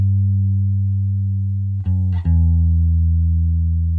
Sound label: bass; musdb18